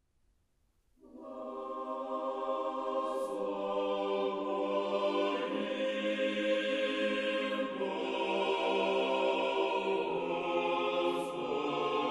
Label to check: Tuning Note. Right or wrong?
Wrong